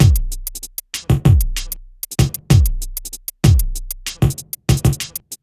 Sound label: beat, Hip Hop R n B; USB Soundscan vol.19; AKAI